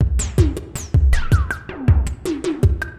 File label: DRUM LOOP ambient 160